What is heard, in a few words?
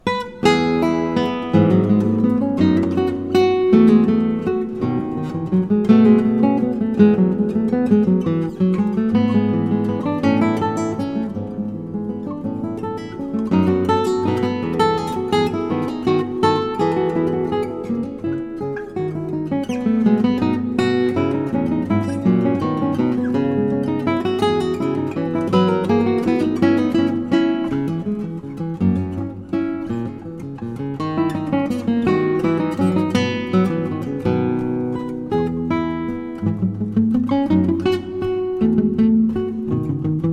Gigue from 4th Lute Suite; Bach